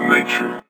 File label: NATURE, Vocoder Vox; E-MU Producer Series Vol. 8 – Platinum Phatt